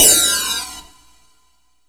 PROCYMB, CYMBALS

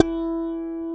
GUI HRM FLA, Acoustic

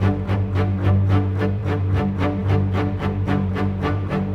Bass, Cello Viola, Orchestral; Sonic Foundry (Sony Creative Software); Orchestral VOL-2; Modern 10

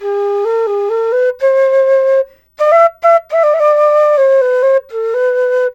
SERULING, Heart of Asia; Spectrasonics; SERULING PH

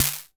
snare mod; snares

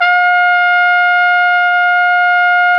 Flugelhorn; Roland L-CDX-03 Disk 2

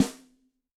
Yamaha DTXpress-II snare

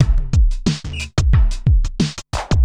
Street Tip, R&B Drums

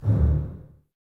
Basses D 1, String Ensembles; Miroslav Vitous; LINKS ORCH

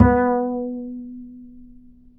B 4, Bass; Spectrasonics; Bass Legends

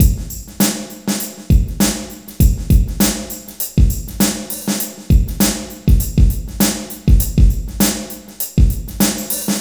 FOUR BARS 100, LOOPS

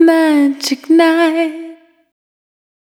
Magic, Single Voice Melodic Loops; Singles

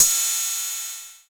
HH606, TR-606; Sound & Vision - Gigapack I; ANALOG

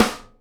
SN GLASS, Live Snares; E-MU Producer Series Vol. 8 – Platinum Phatt (CD 1)

